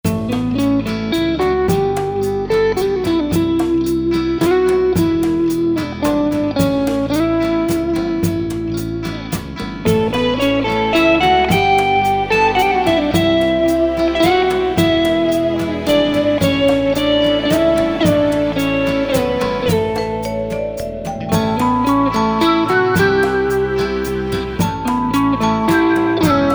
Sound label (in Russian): Gitarnyi spokoinyi